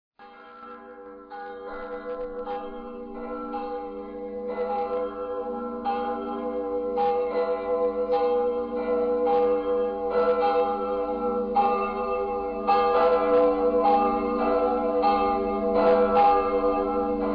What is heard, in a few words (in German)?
glocken